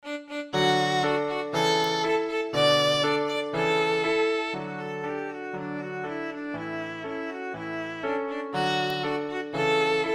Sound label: ecossaise, violin; beethoven